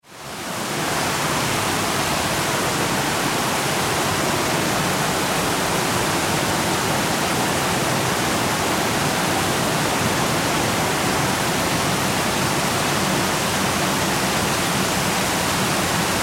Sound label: Water Waterfall; Nature